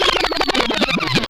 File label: REWIND